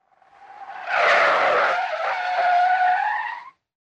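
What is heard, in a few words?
tire squeal when braking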